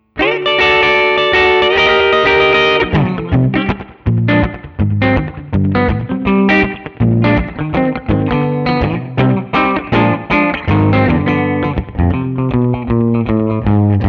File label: Guild SFIII Rockabilly; 2016 Guild Newark Street Starfire III